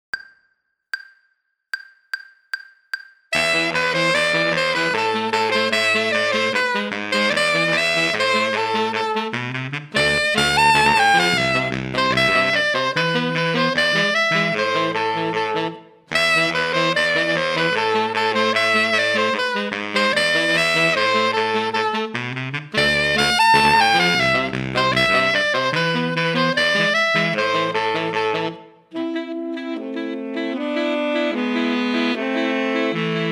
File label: tetris playalong alto